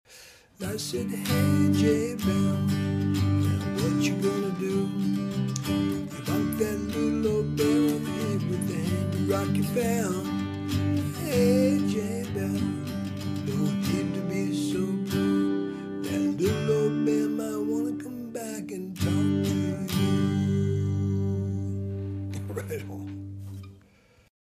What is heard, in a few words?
bell